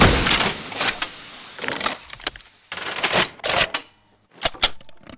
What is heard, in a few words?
Arisaka Overheat Reload; tf2classic